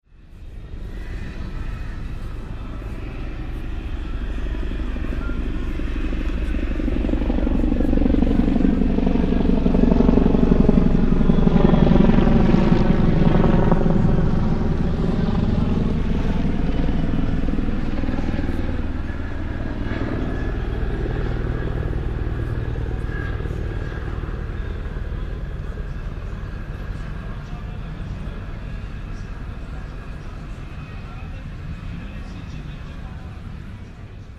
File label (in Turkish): helikopter